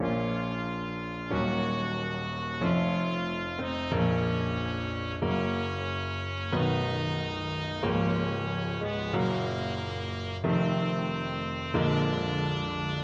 chopin prelude 20 trumpet

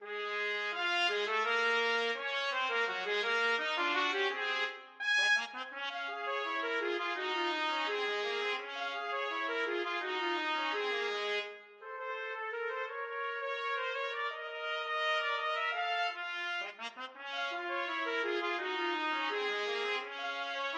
euphonic sounds trumpet duet

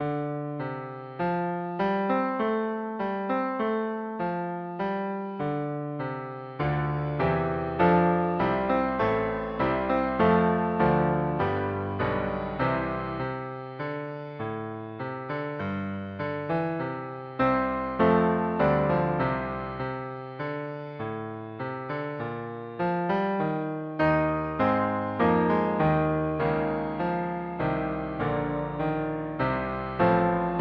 euphonium

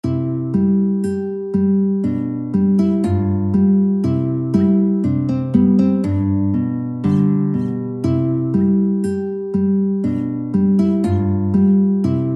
wiegenlied guitar; schuber

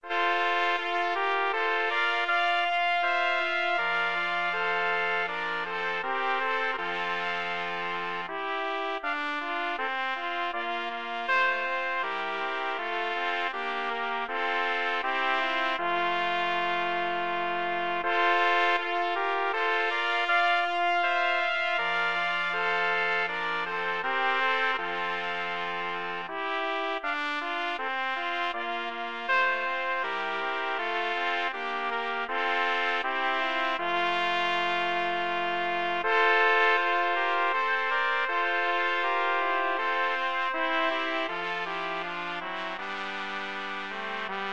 Dance of Blessed Spirits, trumpet quartet

